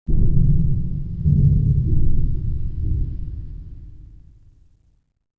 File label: Space Junk Bump; Ambient; Sid Meier's Civilization 4 Complete; Beyond the Sword